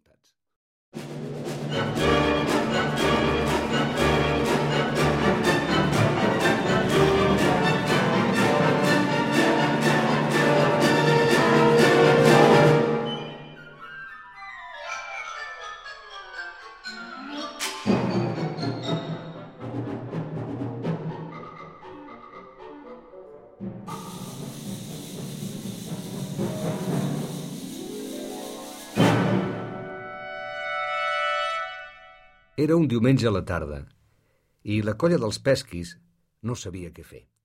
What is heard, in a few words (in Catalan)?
Orquestra Narrador; Viatge a la lluna